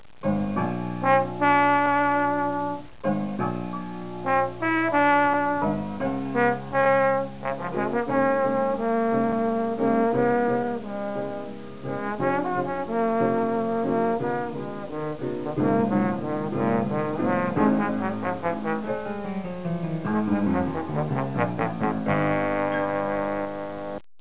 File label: trombone rhapsody